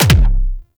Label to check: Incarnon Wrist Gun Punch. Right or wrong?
Right